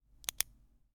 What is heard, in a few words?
Pen Ballpoint Biro Ballpen Click